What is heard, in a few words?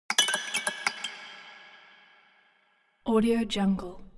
musical horror haunted strings tinkle sequence fast short